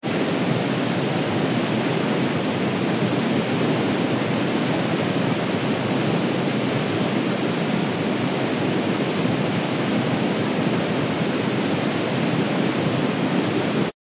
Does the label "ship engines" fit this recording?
Yes